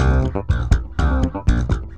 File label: C 120 Steppin Funky